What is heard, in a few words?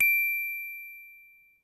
get star; rabbit samurai 2